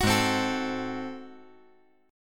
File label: Ab 7th Flat 9th, chord; strummed; JGuitar